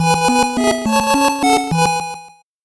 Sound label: 8bit jingle fast